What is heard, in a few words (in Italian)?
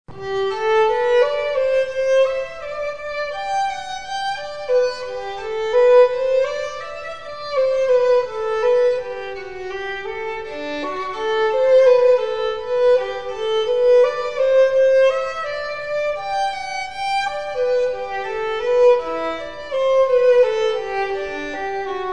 Joy of Man desiring Violino; basi musicali professionali per matrimoni